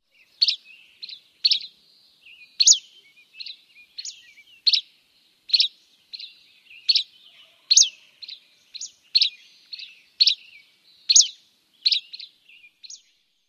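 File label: Buff-breasted Flycatcher dawn song; AZ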